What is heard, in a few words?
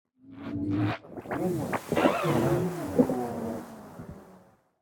GHOSTS Pass-By Strange Activity